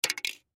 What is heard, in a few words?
Lid Drop Plastic, Lid Drop Cup; Drop Sound Effects; Foley; Objects; Blastwave